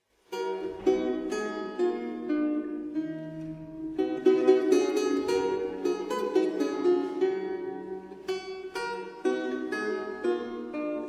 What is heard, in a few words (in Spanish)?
vihuela; La ilustre fregona